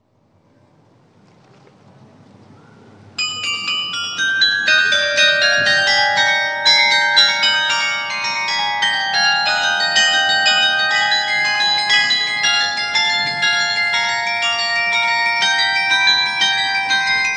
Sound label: Prelude, carillon; Allerlei 2004-2005